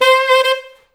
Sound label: SAX A. STAB, Alto Section; Roland LCDP07 Super Sax; Alto Sect FX